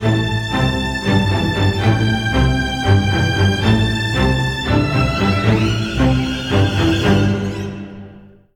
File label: String; The Bureau XCOM Declassified